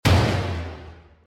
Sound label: impact hit